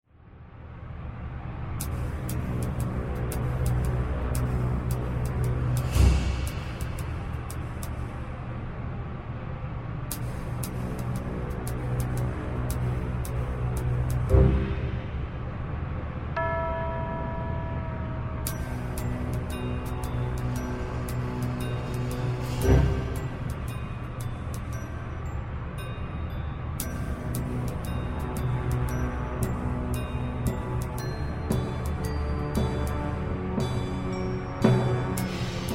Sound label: Machinery Room